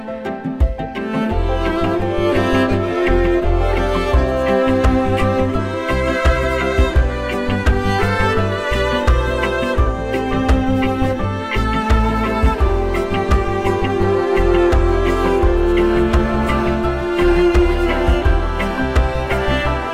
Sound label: PianoCello Cover; Simple Gifts